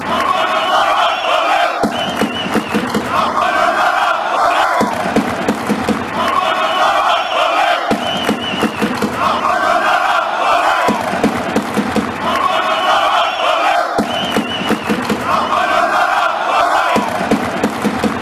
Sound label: chant; apollon